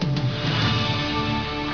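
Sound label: duel reveal answer; usa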